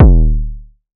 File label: Borg Tom Low, drum kit; Polyend; Stazma & Concrete Collage; Concrete-Dual Borg